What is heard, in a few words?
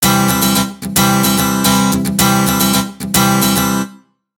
Acoustic Chord; Сэмпл гитары (Ритм-н-блюз): Акустический аккорд; Rnb, Soul; 110 BPM; Гитара